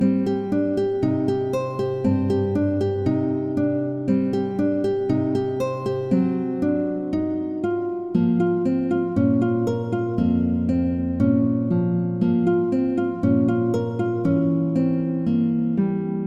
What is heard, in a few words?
Guitar Loop